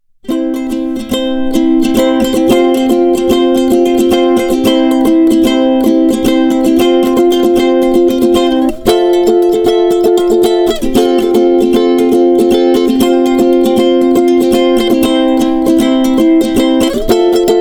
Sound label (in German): Begleitung